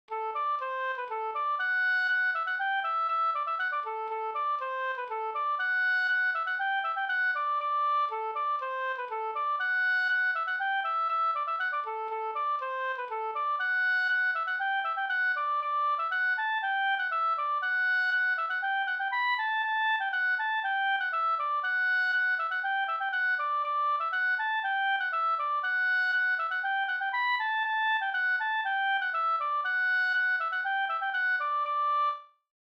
hamburger; e Denis Emanuelsson Dl utl av Mats Berglund

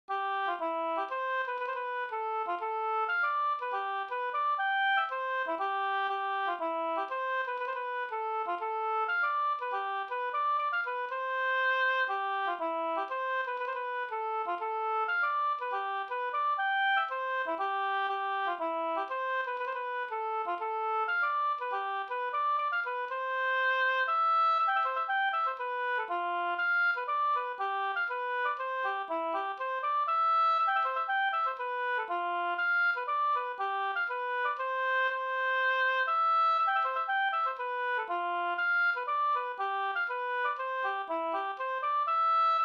polska lillpolska

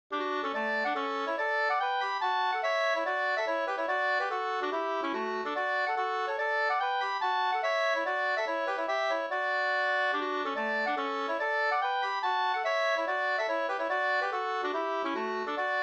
hambopolska

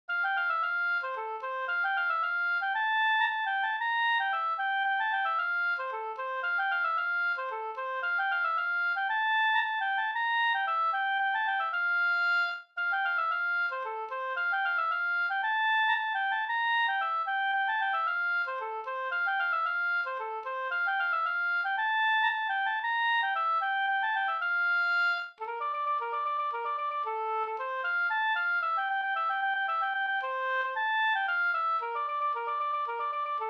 polska; Blodstammarpolskan e Ivar Carlsson Sd

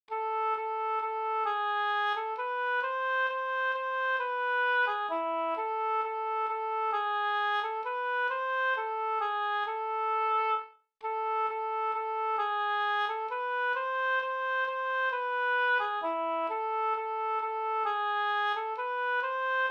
vismelodi langdans; En gang i bredd

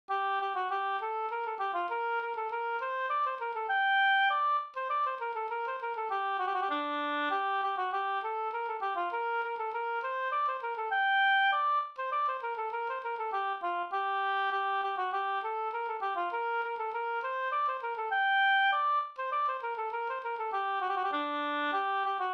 slangpolska

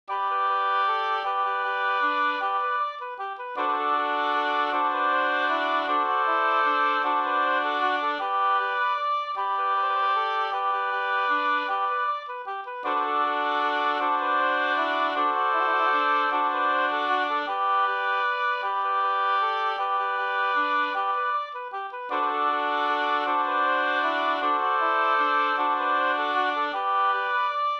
hambopolkett; Kringelstoten Sd

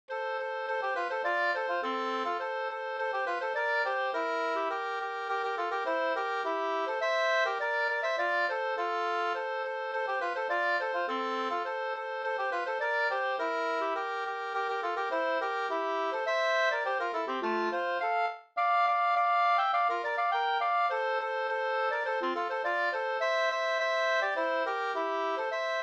snoa

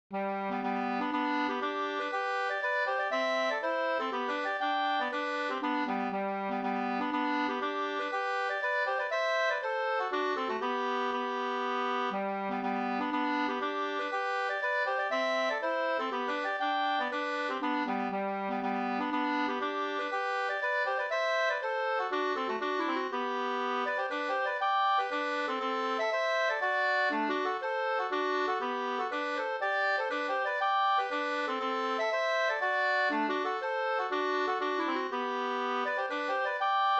polska

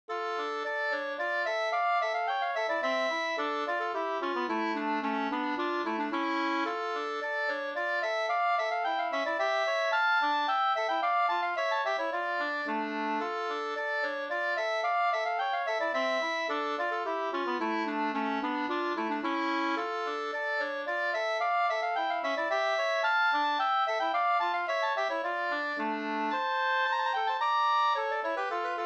slängpolska

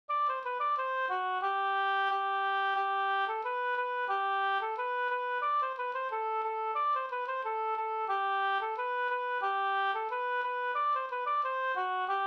folkdans; Dansk marknad

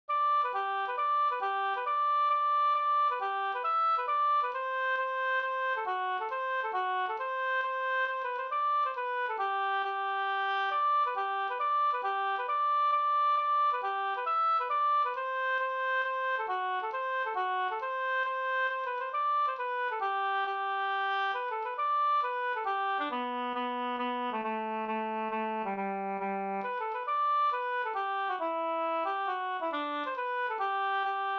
mazurka; fr Hamra e Sigge Mattsson Orsa

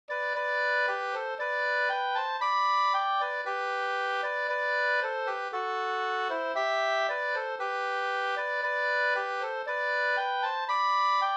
brudmarsch, 2st; Liljendals brollopsmarsch

